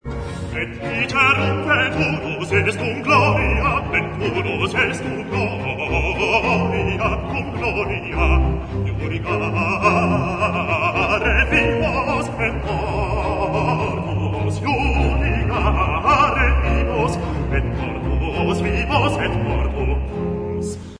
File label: h moll solo